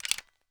m3s90 load

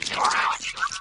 infector idle; flood

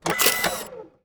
autoshotgun reload; serioussam2

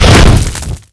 flame, dragon

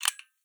m24 insert, weapons

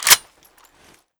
96fs release; weapons

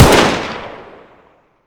ak47 fire; weapons

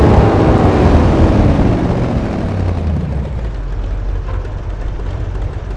throttle off, vehicles; tdmcars

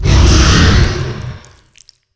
pain, dragon

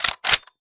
famas cock; weapons

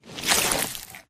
human form short move; flood

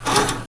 rocketlauncher reload

